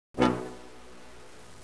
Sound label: PIZZICAT